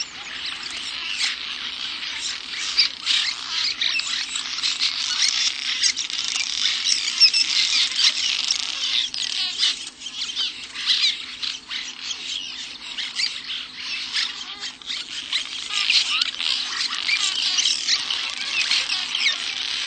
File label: Cliff Swallow; Birds